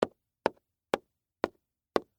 Game Piece Move, Sports, Board, Game, Metal, Piece, Move, Multiple, Times, On, Game, Board; Monopoly; BlastWave